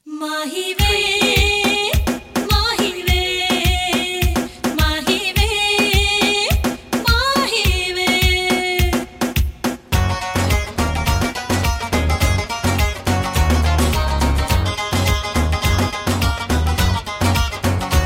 bollywood reggaeton

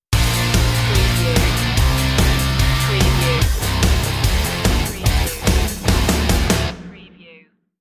kill hit stinger

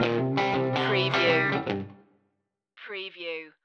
vintage blues stinger

